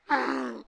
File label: donkey death; Minetest; VoxeLibre; mobs mc